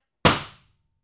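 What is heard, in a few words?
reverb laundry room; harveymudd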